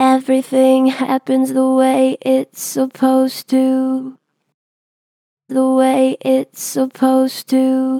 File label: Pitch Flat Gentle, Vocals; Harmor Vocals